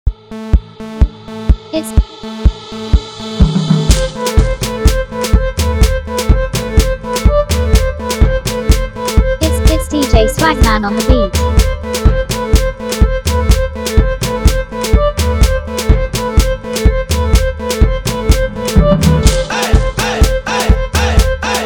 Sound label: Street Loop Beat